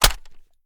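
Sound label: submachine gun reload fast mag hit; reloads; iw8; gmod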